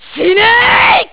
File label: twin sine